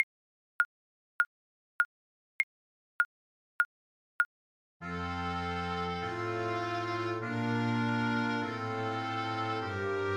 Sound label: horn, French Horn